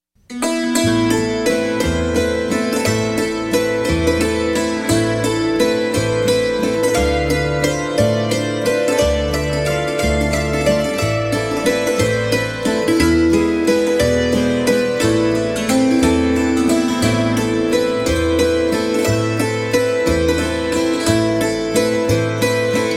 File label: Waltz; Forest Flowers Waltz